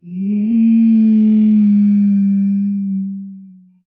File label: transformation languid